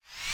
whoosh Forth Far; HeavenStudioPlus; Air Rally

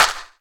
clap; Kitties

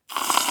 potions drinking; Mineclonia

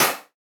hammer weak; HeavenStudioPlus; nail Carpenter

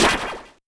ball Start Red; Heaven Studio Plus; toss Boys

